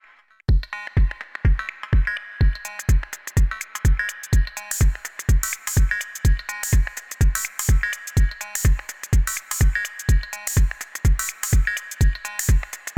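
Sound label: Elektron Analog Rytm Cow Bell Metallic Parameter Locks; Elektron Analog Rytm 1.30c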